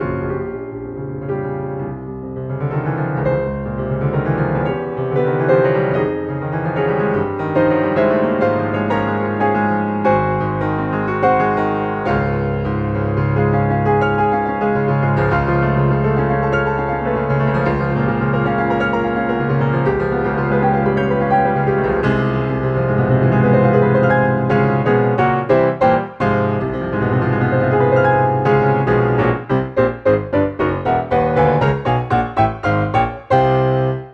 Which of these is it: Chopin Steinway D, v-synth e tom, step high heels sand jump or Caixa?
Chopin Steinway D